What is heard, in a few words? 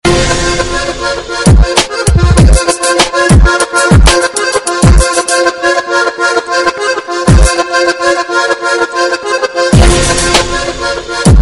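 Garmoshka, accordion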